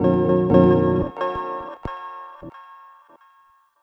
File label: Synth